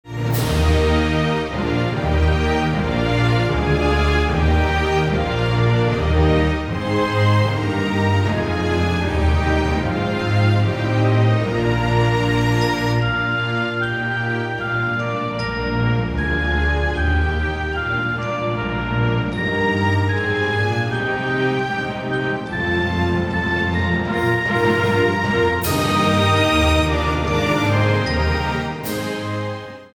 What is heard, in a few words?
Anthem Germany